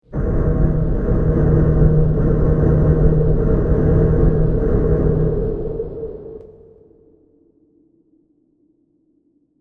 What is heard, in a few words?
Industrial Noise Effect, machinery sound effects; horror